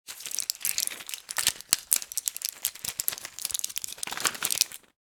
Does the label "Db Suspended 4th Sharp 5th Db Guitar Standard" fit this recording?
No